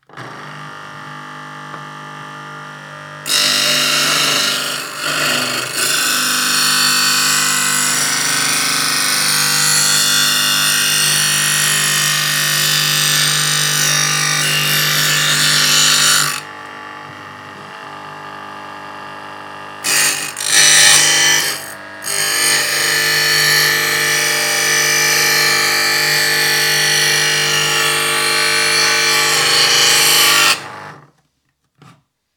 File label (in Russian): electrotochilka noja, zatochki noja